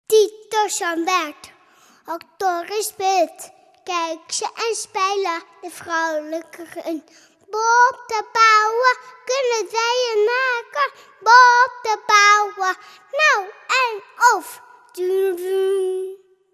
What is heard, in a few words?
a capella